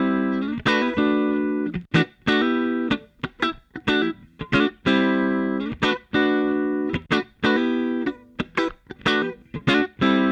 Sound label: guitar, analog funk; Mixed Berry Jam; Sonic Foundry (Sony Creative Software) - What it Is! 70s Analog Funk